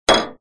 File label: Hammer; c-evo